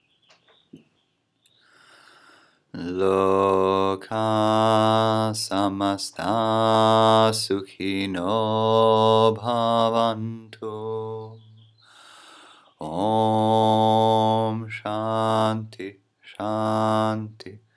satsang chant lokah samasta sukhino bhavantu om shanti